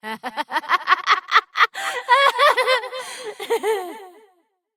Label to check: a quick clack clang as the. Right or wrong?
Wrong